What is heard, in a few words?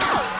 pffow; Truth-Hz - 1-Hit KO Sample Pack